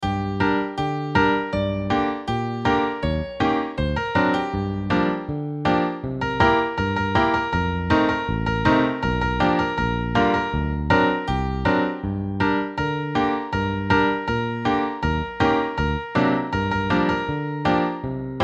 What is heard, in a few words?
idm improv